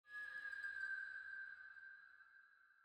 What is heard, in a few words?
ghost call